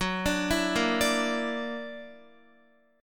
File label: Gb Augmented 7th, Guitar; arpeggiated; 4E,4A,5D,5G,5C,6F